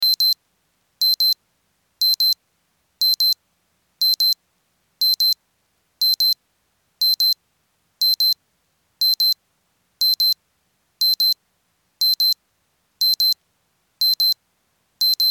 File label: Alarm Wristwatch